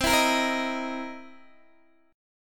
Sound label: C 7th Sharp 9th, Guitar, DADGAD